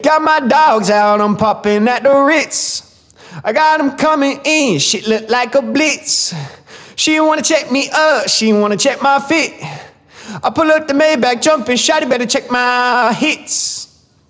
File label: hiphop wet